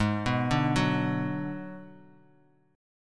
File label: Ab Minor 7th Flat 5th, Bass Standard; arpeggiated; 16,14,12,11; JGuitar